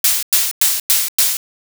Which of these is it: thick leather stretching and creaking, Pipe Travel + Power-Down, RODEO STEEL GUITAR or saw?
saw